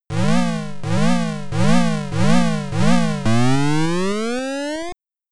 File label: CAR; mikie sc 3000